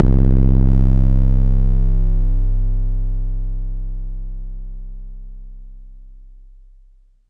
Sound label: BD